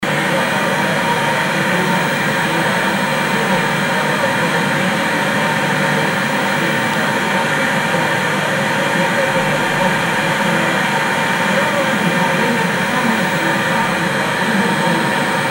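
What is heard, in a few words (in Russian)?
звук помех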